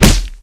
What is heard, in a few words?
body medium impact hard